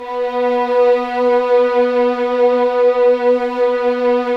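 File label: Violin Ensemble; Piano Layer, Grnd Str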